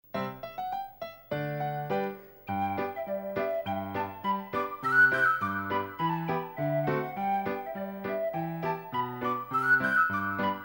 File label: carrols polka, tin whistle